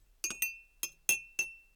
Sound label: teacup